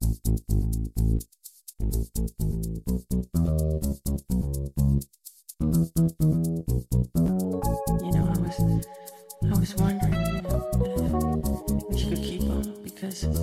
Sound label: Funk; Castle Funk; renoise